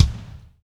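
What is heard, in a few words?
floor tom, left; Spectrasonics; Burning Grooves CD2; HIT ME KIT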